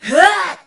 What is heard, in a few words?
Attack